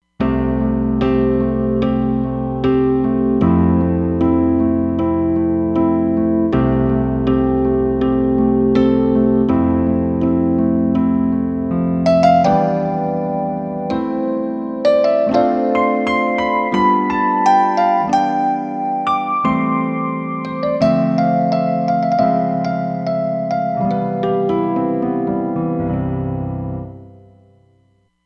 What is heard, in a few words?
Piano, Roland Fantom XR